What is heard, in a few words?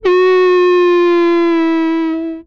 Bomb, retro arcade; Guns